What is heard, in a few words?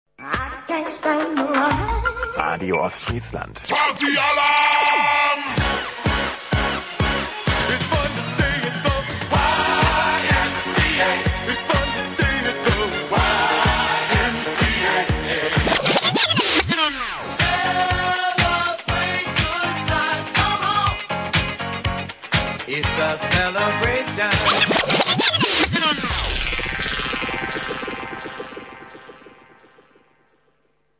Partynight Jingle